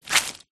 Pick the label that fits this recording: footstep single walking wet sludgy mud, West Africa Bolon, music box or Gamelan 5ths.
footstep single walking wet sludgy mud